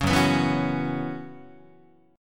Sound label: F sharp 7th Suspended 4th, Guitar; strummed; F#7sus4 Chord